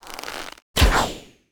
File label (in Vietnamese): Tieng keo cung ten roi sao do ban veo